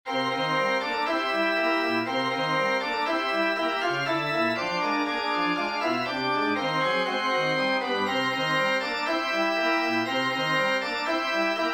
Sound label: interlude for organ